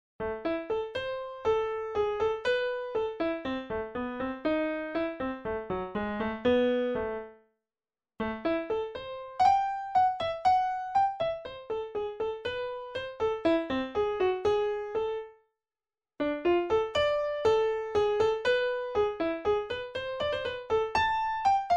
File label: Piano